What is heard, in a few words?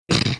snorting